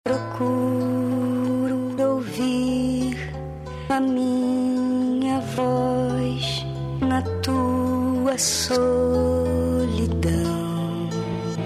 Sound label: beat